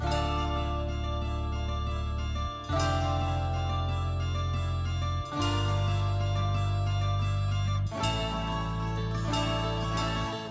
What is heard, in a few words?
guitar, Sad